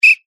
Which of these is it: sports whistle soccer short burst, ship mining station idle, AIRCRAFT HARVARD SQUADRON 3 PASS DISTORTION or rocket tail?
sports whistle soccer short burst